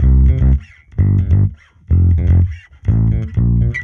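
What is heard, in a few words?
Electric Bass Bridge Finger 125 C2; musicradar; bass boutique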